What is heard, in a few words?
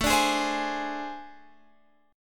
A Minor Major 7th Double Flat 5th, down